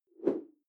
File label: whoosh simple short tonal; swooshes-swishes